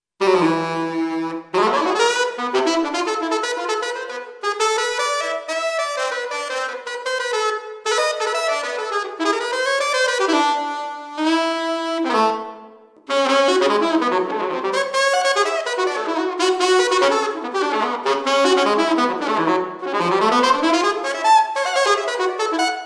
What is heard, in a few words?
sax solo